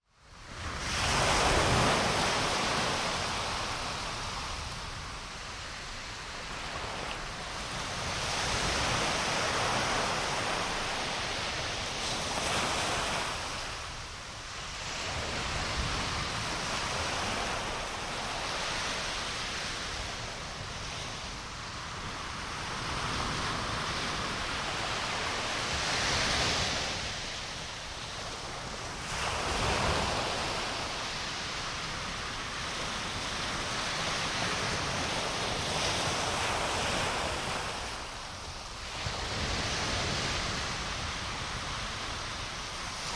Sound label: ocean wave